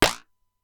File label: Simmons SDS-9; drum machine; Factory D4